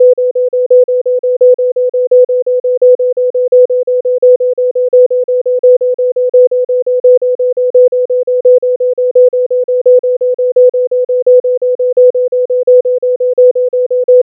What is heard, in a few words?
volume beat 192 ms